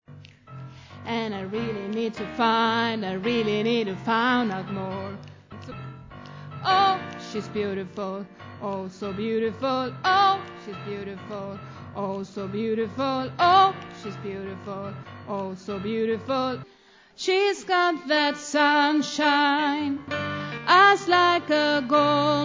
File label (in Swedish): alt, stammor